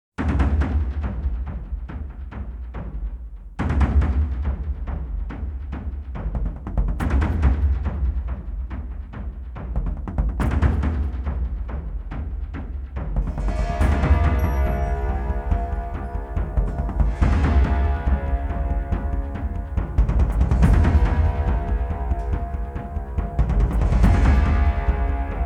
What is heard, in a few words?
Thunder Drums